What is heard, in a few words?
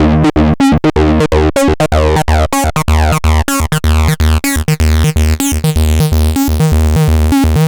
Acid Bass; Sonic Foundry (Sony Creative Software); Underground UK House & Electro